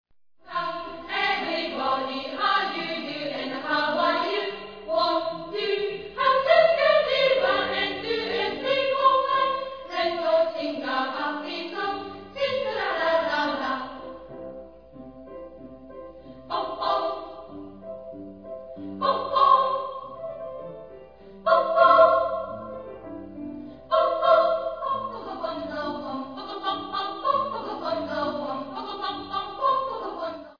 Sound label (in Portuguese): Shojoji infantil; musicas infantis